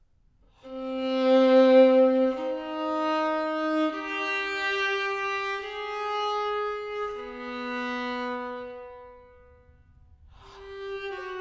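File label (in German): Königliches Thema für Violine solo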